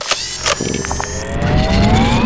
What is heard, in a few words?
reload, laser; weapons